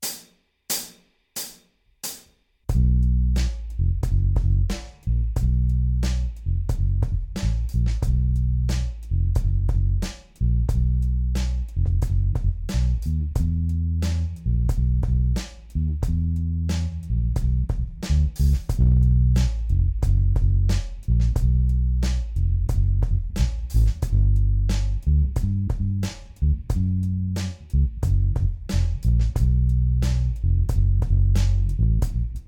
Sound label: Squire PBass - pickup full ON & Tone full OFF; Preci-Bass-Vergleich